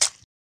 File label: mud